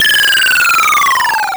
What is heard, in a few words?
falling